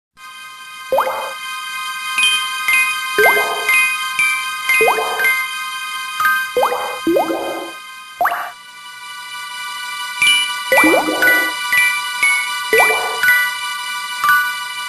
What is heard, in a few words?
Grotto Groove; Sabre Wulf